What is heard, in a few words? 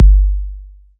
boom, Drum Kit